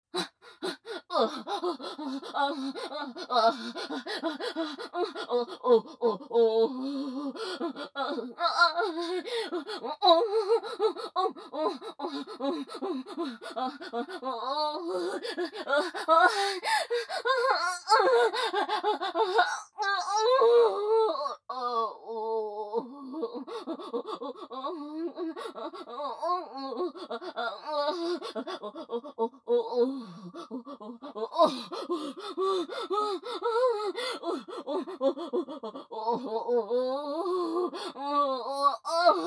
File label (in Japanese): 喘ぎ声・激しい・正面左下